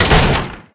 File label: Close; life of a naomi; Naomi Carrigan